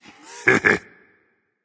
heh heh berserk; cuchulainn

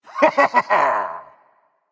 yay berserker; cuchulainn

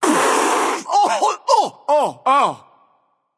nv clear throat; smite; voicepack; mercury

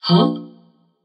confused defeat; babayaga; smite

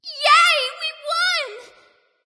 nv yay; smite; awilix

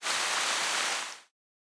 defeat rain; smite; chaac

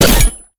Impact Small; smite; god bakasura

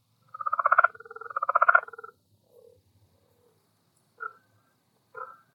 ambience insect; smite

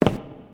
defeat step; smite; chiron

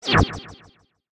staff fire; smite; ahpuch skin10

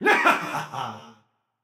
yay, voicepack; smite; jorm

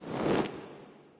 armor; smite; poseidon

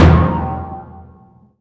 Roll; Smite; god Kumbhakarna

